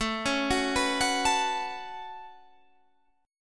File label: A 6th Add 9th A down Guitar Standard; A6add9; arpeggiated; capo=2